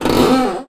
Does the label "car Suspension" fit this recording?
Yes